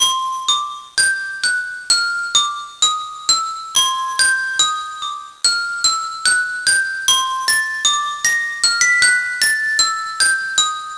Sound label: Menus Loop; Junior Mystery Quest